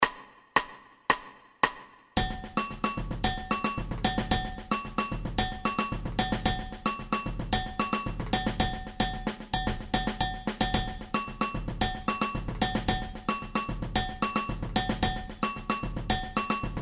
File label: Levada AgoGo, samba